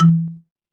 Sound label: feedback confirm